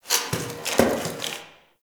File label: Box Of Trash Rustle